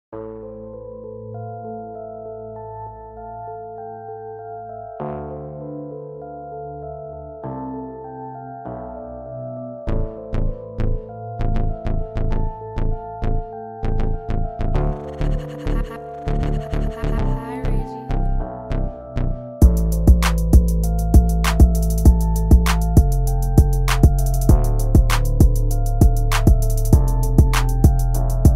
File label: Instrumental; Prod. By Reggie Beatz